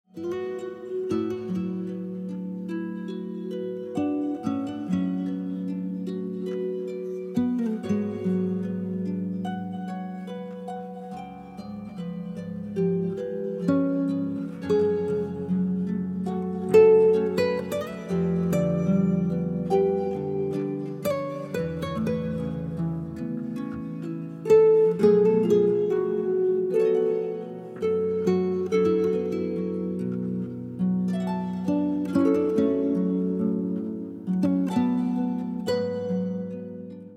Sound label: late night guitar